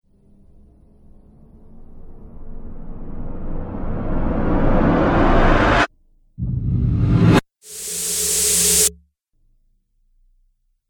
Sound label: Cinematic Riser Pack; Rise And Dive Sound Effects